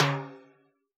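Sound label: timbale low, Yamaha DJX Rock Kit; 144 Rock Kit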